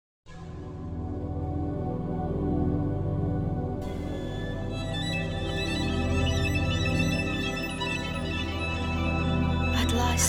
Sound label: Freezing Atmosphere